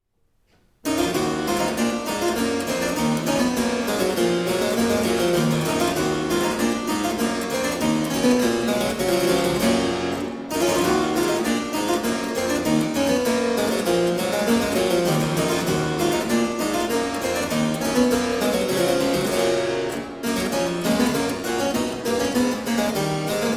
Bouree from Suite in E minor; BWV 996; Johann Sebastian Bach

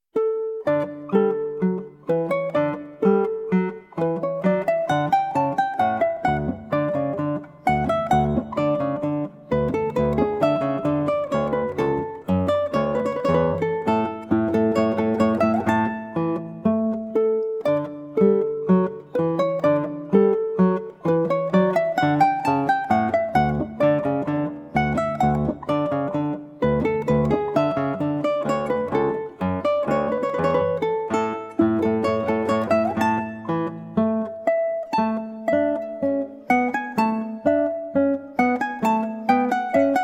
March, Guitar and Ukulele; BWV Anhang 122; JS Bach; Johann Sebastian Bach

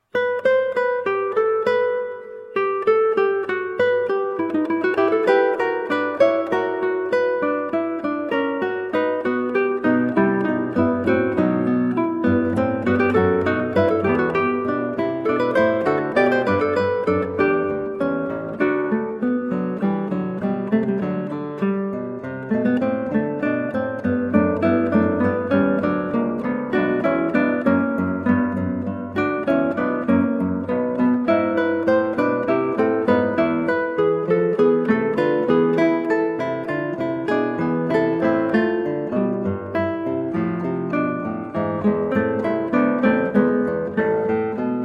Allegro from Trio Sonata in E Minor, Guitar; Handel; George Frideric Handel; HWV398

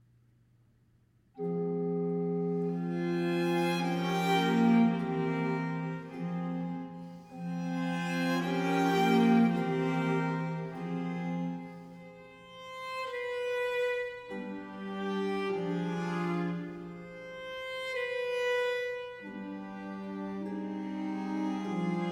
Sinfonia; Christ lag in Todes Banden BWV 4; Bach

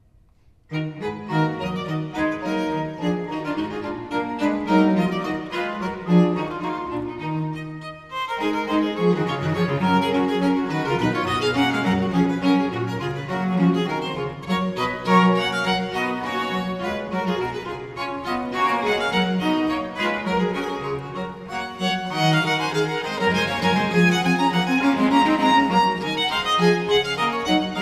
Sonata in e minor Rondeau; Sonata No. 3; Haendel; Sonate a trio op. 5

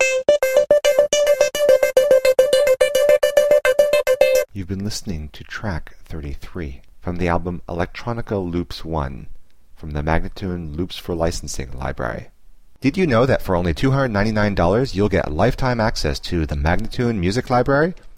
107 D spoken; Electronica Loops 1

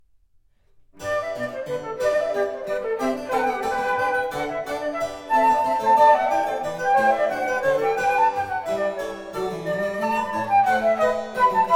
Trio Sonata in G Major Vivace; BWV 1038; Bach; Sonate a tre

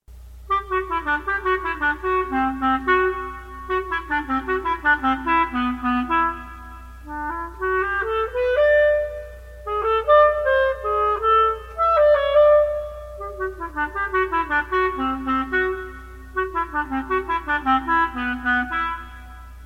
Tango Criollo; Angel Gregorio Villoldo

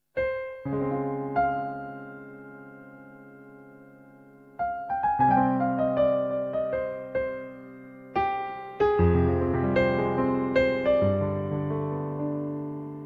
Drops of Rainbow (Improvisation)

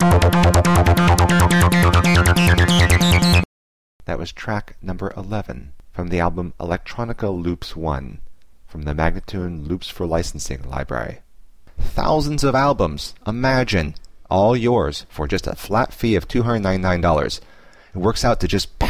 140 C ambient teknology spoken; Electronica Loops 1

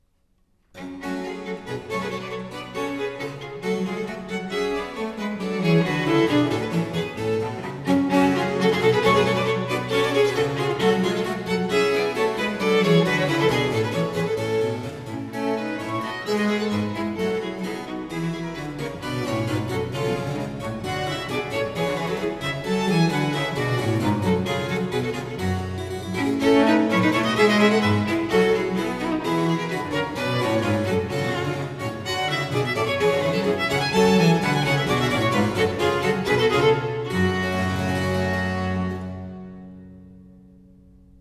Sonata in g minor Bourree; Sonata No. 5; Haendel; Sonate a trio op. 5

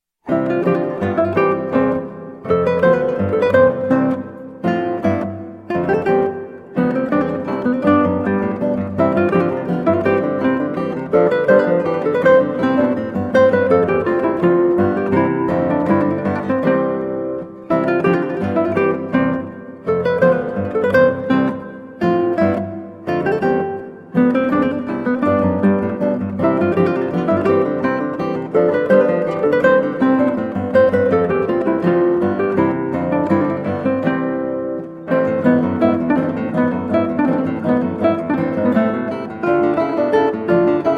Allegro, Guitar; Recorder Sonata in C Major HWV 365; Handel; George Frideric Handel

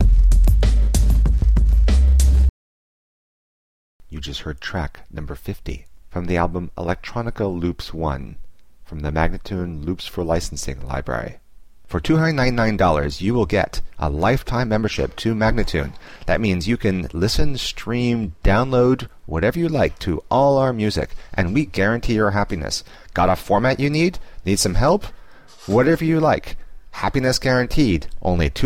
096 G spoken; Electronica Loops 1

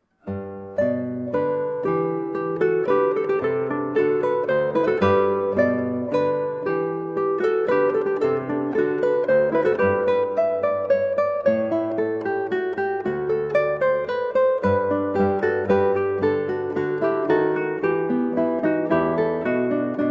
Trio Sonata, Guitar; Soave; TWV 42 E4; Telemann Trios; Georg Philip Telemann

